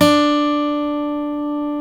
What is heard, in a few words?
STEEL D, FOLK STEEL Guitars; Spectrasonics; Hans Zimmer Guitars VOL-1